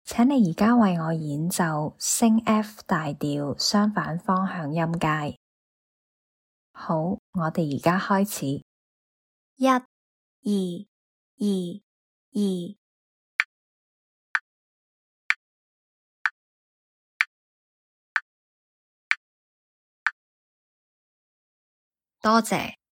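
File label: F sharp major contmotionscale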